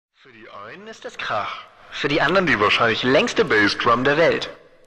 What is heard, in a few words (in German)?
Laengste Bassdrum